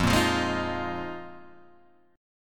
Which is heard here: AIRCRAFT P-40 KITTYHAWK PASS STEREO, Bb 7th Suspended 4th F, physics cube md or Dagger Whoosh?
Bb 7th Suspended 4th F